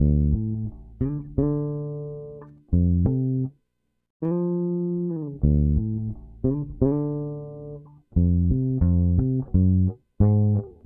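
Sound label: bass 88 D minor; Hip Hop & RnB 3; Sweet Jasmin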